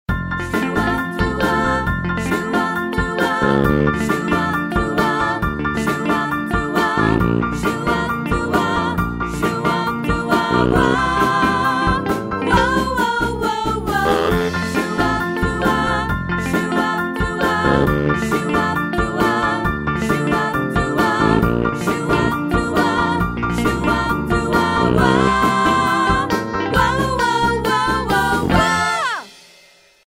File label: 50s du wap